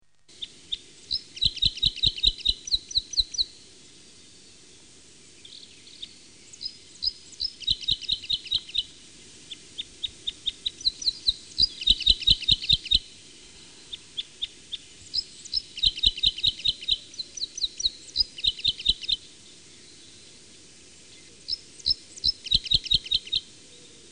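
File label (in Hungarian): Keresztcsőrű, Madarak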